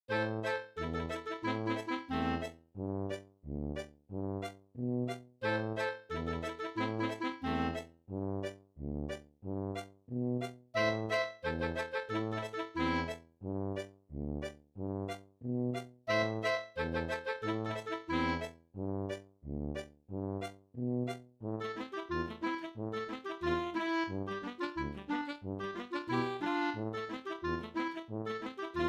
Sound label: scottish reggae acoustique